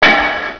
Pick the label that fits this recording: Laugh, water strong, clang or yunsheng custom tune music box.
clang